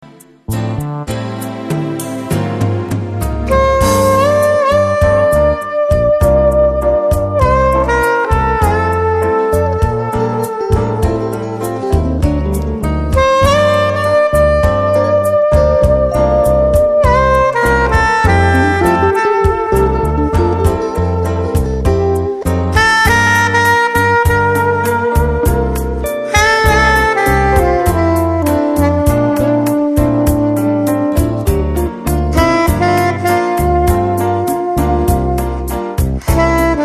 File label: Jazz